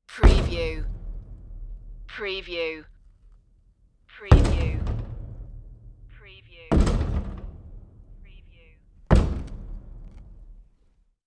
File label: dark destruction hits